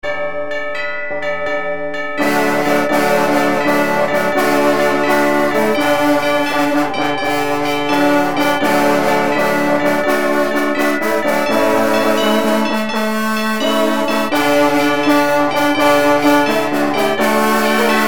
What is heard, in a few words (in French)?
basse canal droit